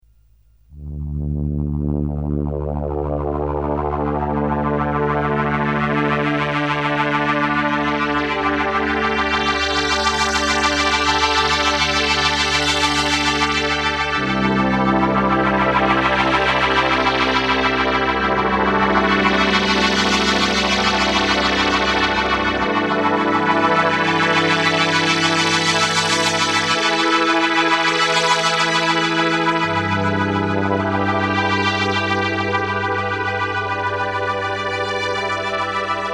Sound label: synth c3, scanner vibrato